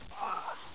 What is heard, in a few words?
pl ahhh, player